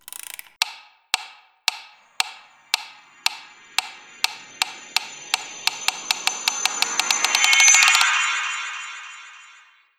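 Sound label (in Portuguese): metrônomo Maelzel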